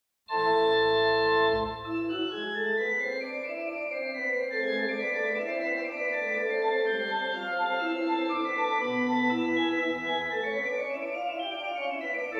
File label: Little Prelude and Fugue in A Minor Prelude; Bach; BWV 559